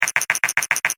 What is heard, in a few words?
notification Typing